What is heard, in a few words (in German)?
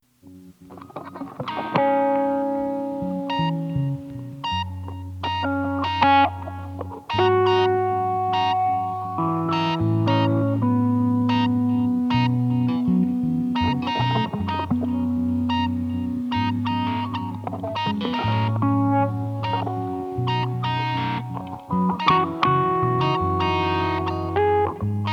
morsezeichen, looping